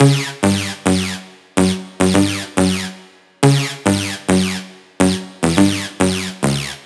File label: Riddim Synth Loop F# (140); Subtrakt; Ghosthack; Riddim Essentials 2020